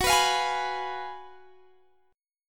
Gb Major 7th Suspended 2nd Suspended 4th, Guitar Standard; GbM7sus2sus4 strummed; JGuitar